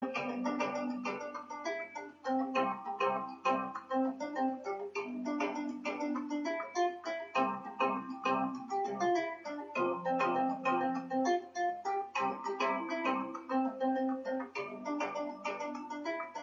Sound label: happy african instrumental ringtone